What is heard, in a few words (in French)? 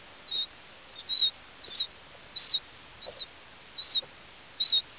poussin sauteur